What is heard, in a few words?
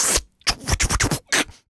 Human Beat Box, 140; Loopmasters